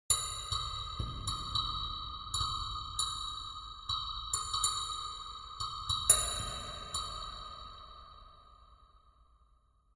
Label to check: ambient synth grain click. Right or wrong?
Right